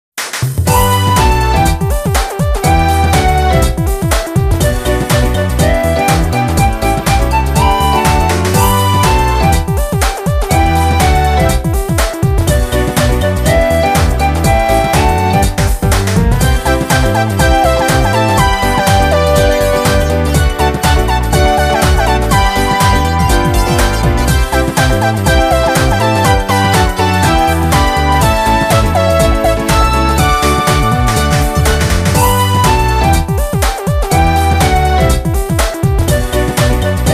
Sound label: Music Menu event